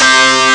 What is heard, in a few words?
rythm gui; franetic; Amiga Soundtracker Sample Packs